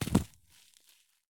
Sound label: soil landing; Escape from Tarkov